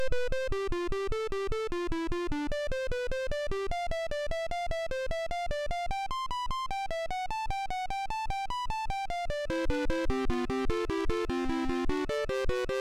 Orgel Spieler, MOS6581R3

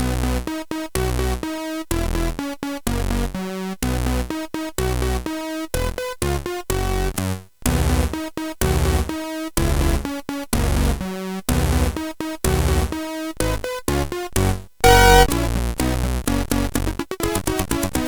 Paukenschlag; hvsc

